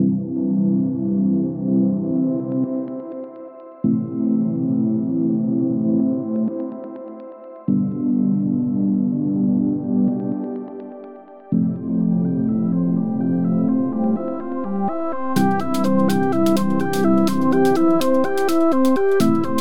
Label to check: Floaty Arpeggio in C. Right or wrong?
Right